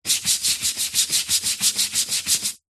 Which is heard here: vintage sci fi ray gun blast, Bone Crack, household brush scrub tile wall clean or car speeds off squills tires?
household brush scrub tile wall clean